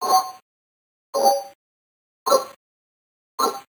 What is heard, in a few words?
Arcade Click Negative